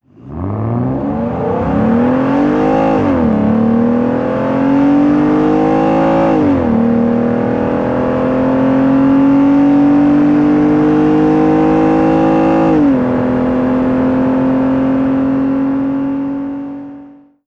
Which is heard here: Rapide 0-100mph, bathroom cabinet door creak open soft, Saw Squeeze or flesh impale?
Rapide 0-100mph